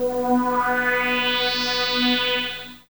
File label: SYN, Hip Hop and R&B Drumloops; Best Service; ProSamples vol.01; AKAI